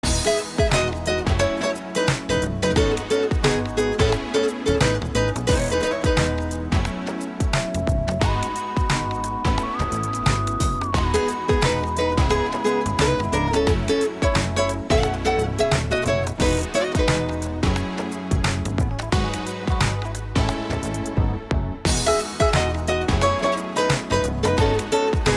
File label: Electro Arrangement; N64 Koopa Troopa Beach